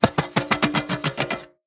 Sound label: train starting; soundscrate